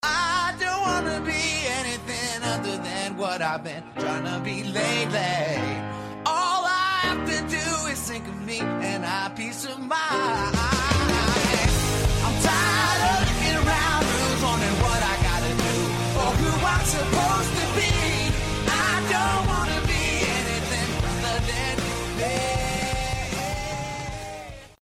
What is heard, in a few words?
theme music; one tree hill